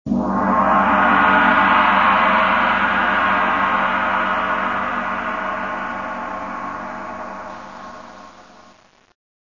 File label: gong; So indeterminat